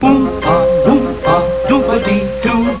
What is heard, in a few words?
oompah